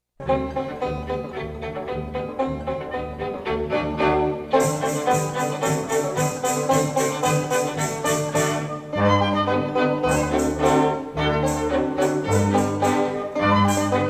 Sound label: Banjo Polka